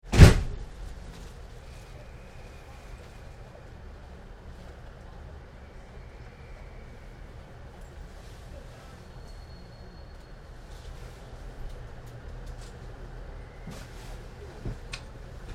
Train Door Slams and Interior Atmosphere Stationary; Voices and movements - background tannoy announ